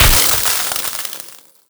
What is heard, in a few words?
spark sparkles